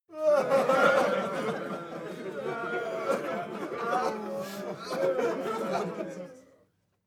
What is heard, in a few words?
walla sad short; menschen; koerpergeraeusche